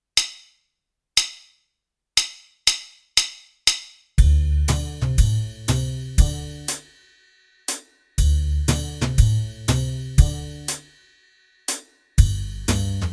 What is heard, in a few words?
Muskrat Blues Track